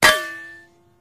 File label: Hit Bullet Metal